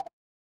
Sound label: feedback merge